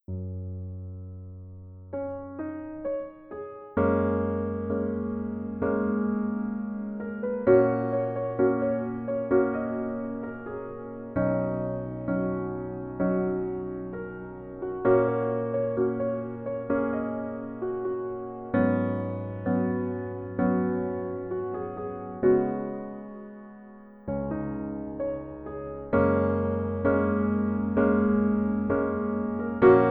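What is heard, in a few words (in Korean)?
Piano version 멜로디MR -1키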